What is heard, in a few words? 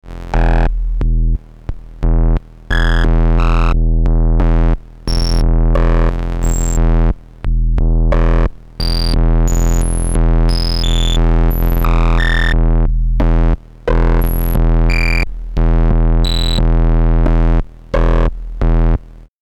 Synthesizers VCO VCF Feedback Dry